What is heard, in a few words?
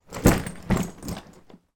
foley luggage suitcase fall over